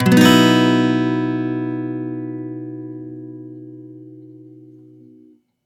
One Shot B add11 downstroke, Acoustic Guitar; Sonic Foundry (Sony Creative Software); Songwriter Acoustic Guitar Companion

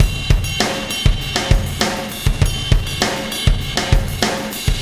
Wormhole Beat, Processed Drumkits

